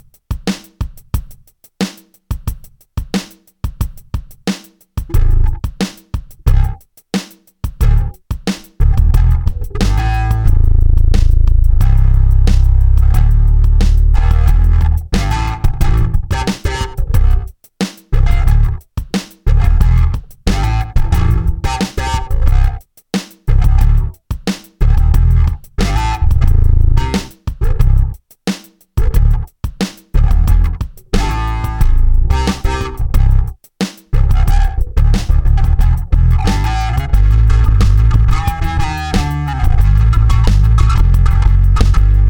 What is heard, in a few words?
belly sample bass